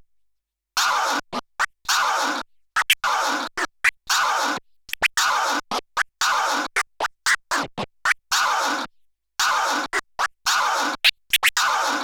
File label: Skratch exercise